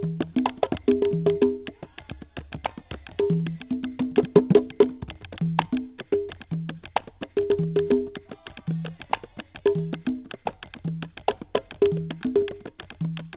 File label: board drums